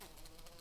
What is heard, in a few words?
Lapidarius queens, bumblebees